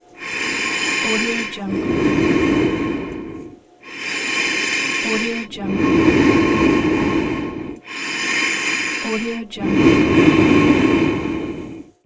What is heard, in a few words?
breathing mask